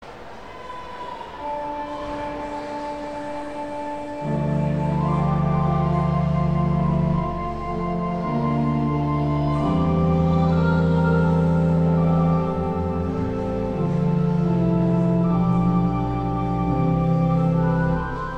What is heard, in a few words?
Organ, Chorale Prelude; St. John's Cathedral, Jacksonville Florida; Dietrich Buxtehude; 'When Jesus Went To Jordan's Stream'